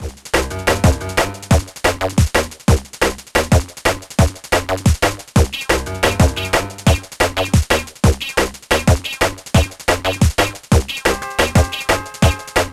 School Fast

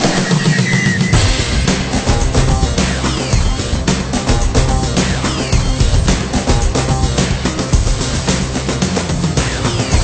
Menu Loop; tiger woods 99 pga tour golf ps1 windows gamerip 1998

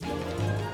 Music Menu Theme Start; asterix obelix slap them all 2

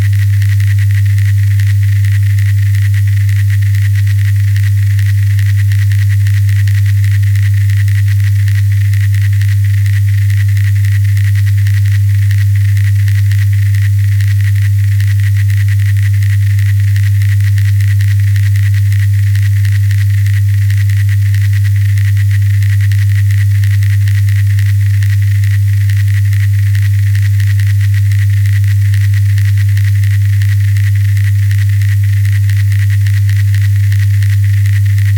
Ship Menu Crackle; ps4; gamerip; The Long Journey Home

